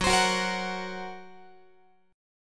Gb Major Flat 5th, Guitar

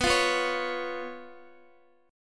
B Suspended 2nd Flat 5th B down Guitar; strummed; JGuitar

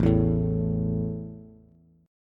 A 5th A down Bass; strummed

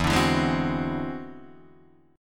E Augmented 9th E down Guitar Open D

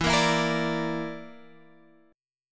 A sharp Major Flat 5th

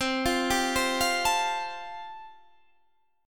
C 6th Suspended 4th C, chord

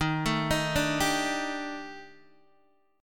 Eb Major 7th Flat 5th Eb Guitar DADGAD

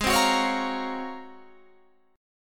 G sharp 13th, Guitar, Standard; strummed; JGuitar

